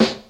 rap snare one shot d key, snare drum sample tuned to the D note.; snare drum samples